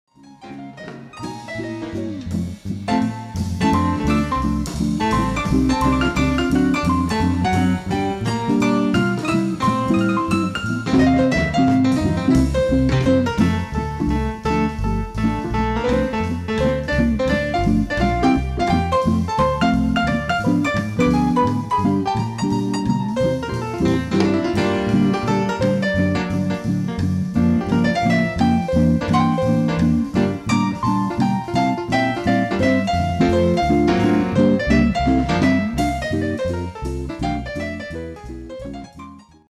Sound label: PNO